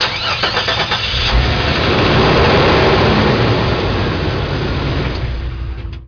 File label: jeep start